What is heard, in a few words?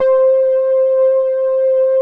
PADS; Pro-Rec - Synthsonic; Z1 PADS